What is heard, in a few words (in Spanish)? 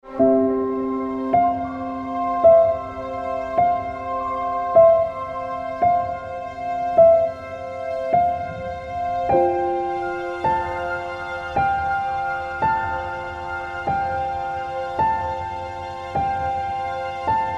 orquestal emocional